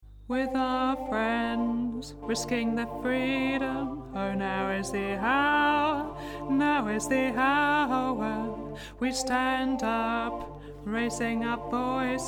tenor

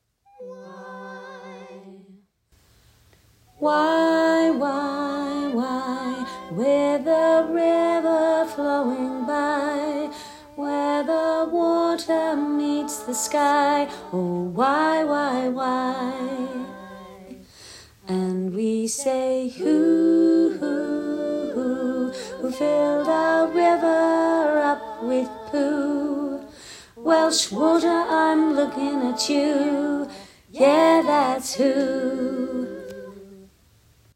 alto

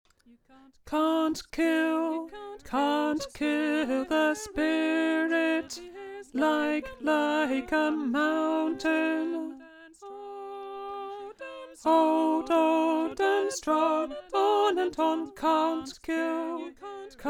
Polyphony voice

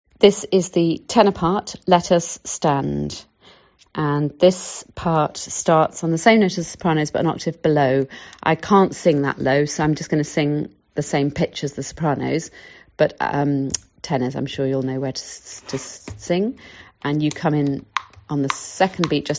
tenor; The Climate Choir Movement

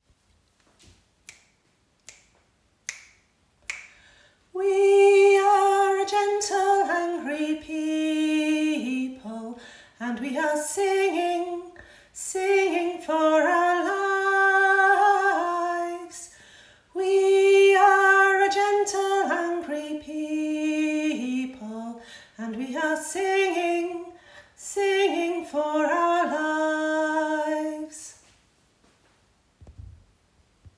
Melody; The Climate Choir Movement

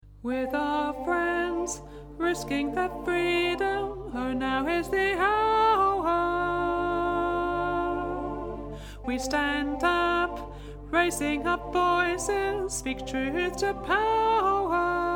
soprano

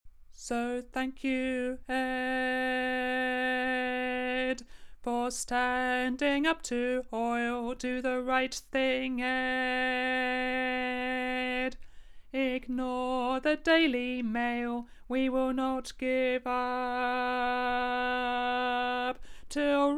tenor

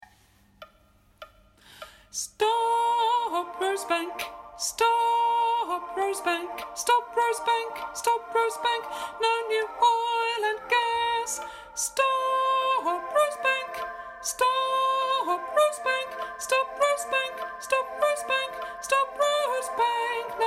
SOPRANO

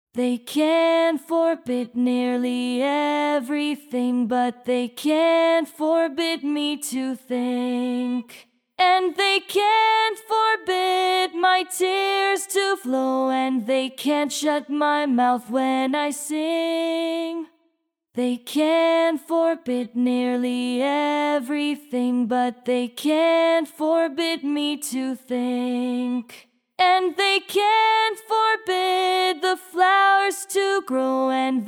voice LOWER HARMONY

